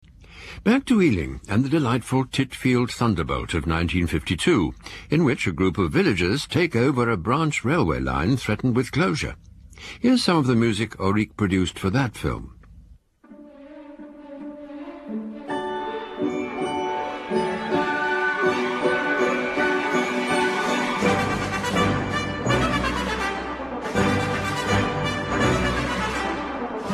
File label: BBC Concert Orchestra, theme music; The Titfield Thunderbolt